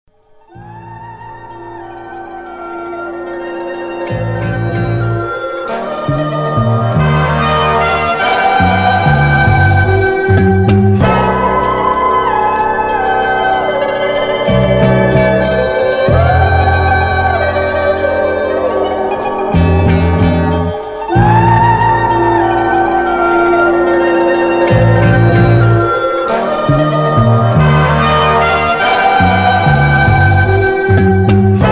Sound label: Interlude (Instrumental)